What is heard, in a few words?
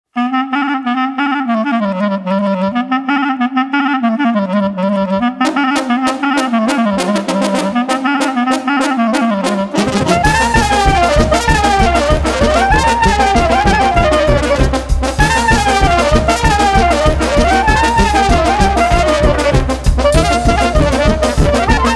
Fanfare Batuta La Clarinet